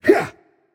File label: grunt attack; smite; voicepack; rama